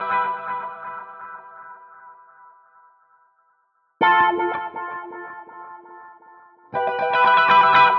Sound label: Guitar; Goblin